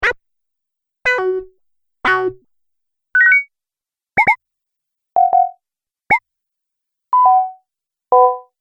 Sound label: Multimedia Large Sound Pack, high tech sound effects